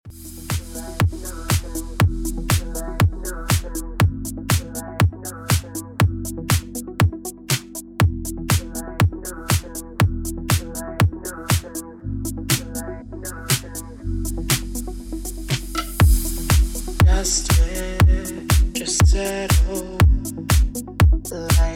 Remix Ringtone

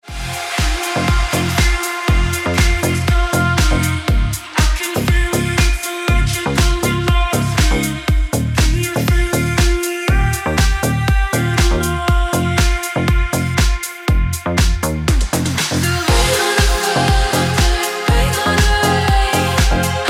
Ringtone

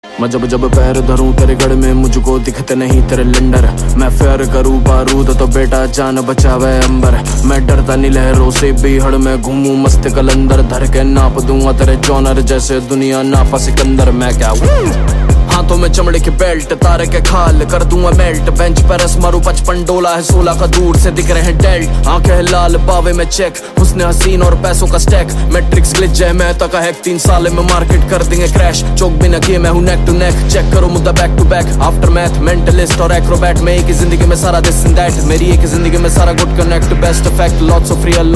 Hindi Ringtone

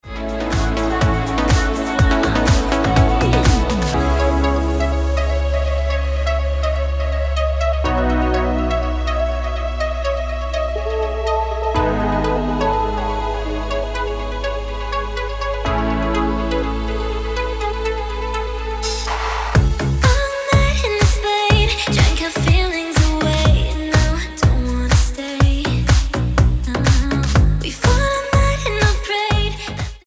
Ringtone

